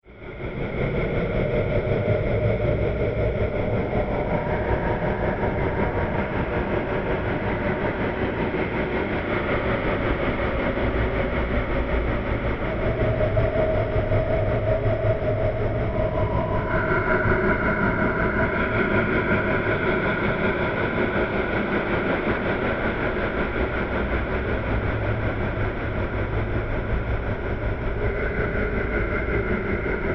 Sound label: Soundscape Horror Motion, scary soundscape sound effects